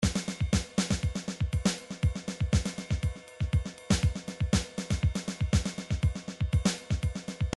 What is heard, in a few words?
midi drums samba